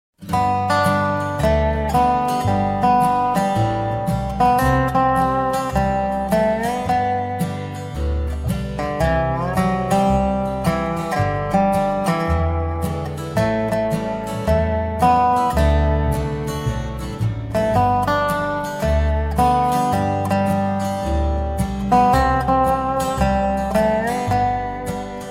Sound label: Dobro